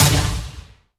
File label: hit left; junisaber